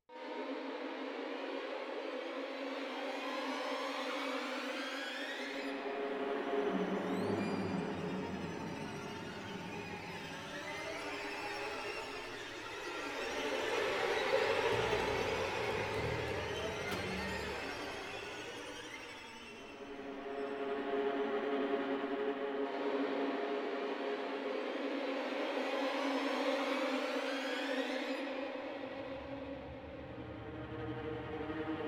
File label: Cinematic Music - Tension